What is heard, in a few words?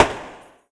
gun far; Weapon-Explosion